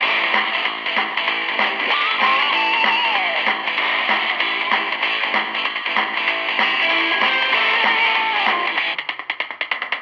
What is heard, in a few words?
hotel music